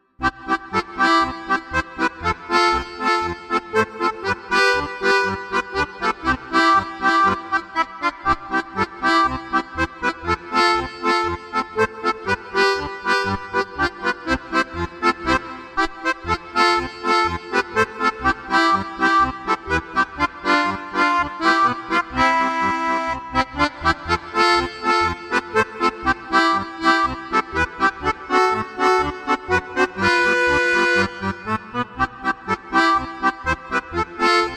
Happy Foxtrott Duet

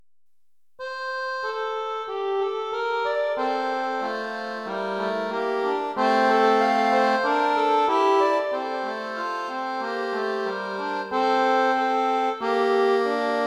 Echo

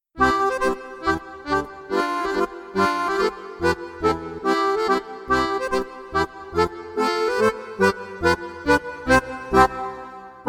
Pleasant Mazurka Duet

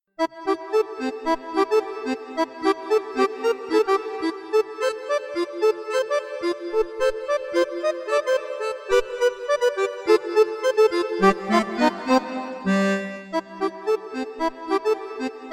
Afternoon Rock

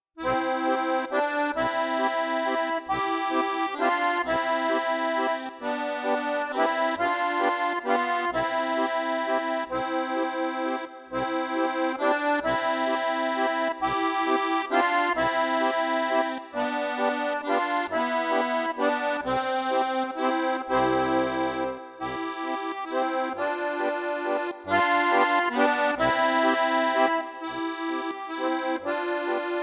Easy Waltzing Duet

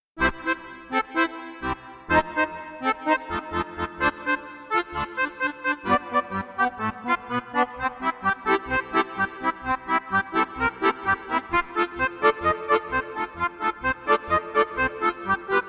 Morning Boogie Duet

